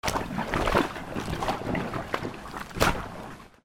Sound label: HOUSE